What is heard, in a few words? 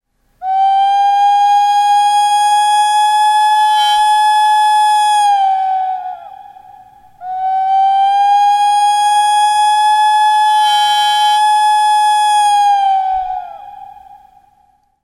ocarina